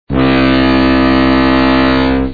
tug; Sailing ship; sailboat gallion

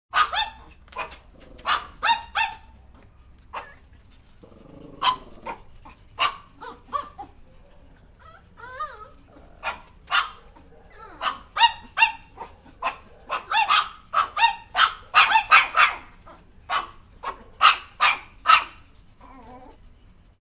Chihuahua barking; dogs

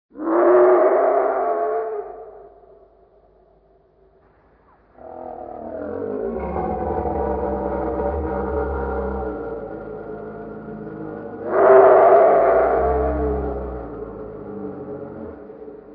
Dragon groaning; Fire Breathing Dragons Wizards Fantasy

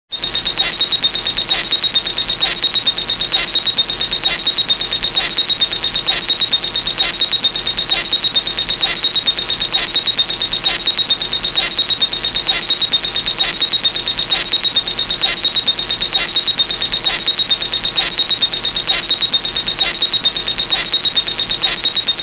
Telemetry beeps

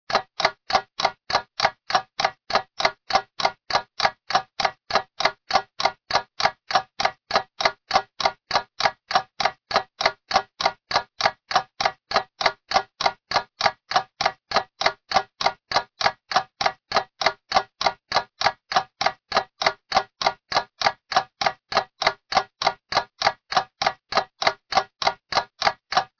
Ticking clock sound; alarm clock

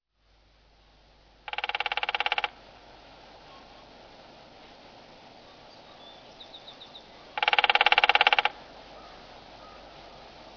Woodpecker tapping; birds

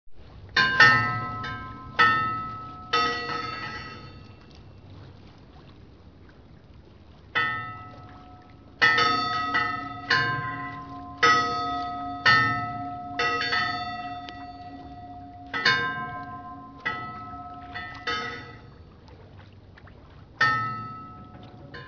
Buoy in water; Lighthouses Ocean Water

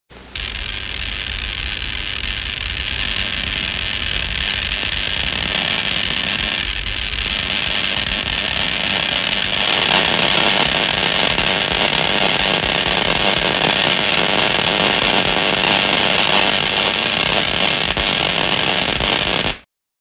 High voltage; Doctor Frankenstein and Frankenstein monster

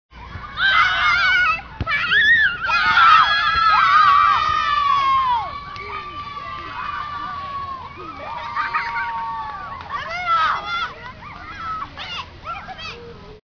Kids playing soccer

